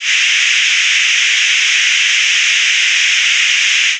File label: Noise Fricative SH